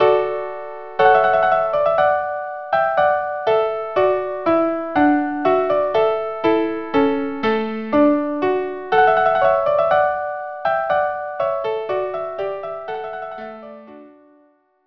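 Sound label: violin duo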